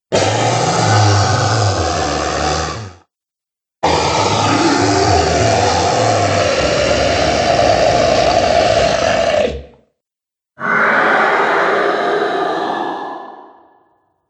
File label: Three Dragons, monster; horror